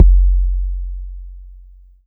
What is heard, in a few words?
kick, drums; USB Soundscan vol.03 - Pure Electro; AKAI